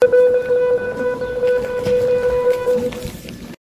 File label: the school bell